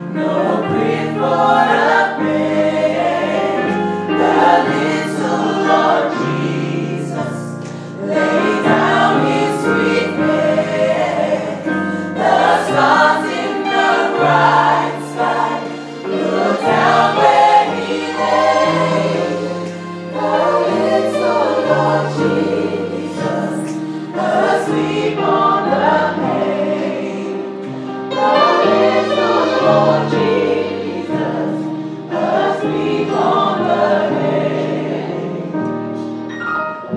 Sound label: away in a manger sung by the gospel choir; London Community Gospel Choir